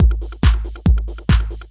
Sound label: solid tekno disco, drum loops and breaks